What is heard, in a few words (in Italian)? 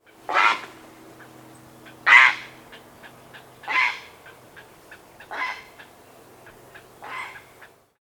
airone cenerino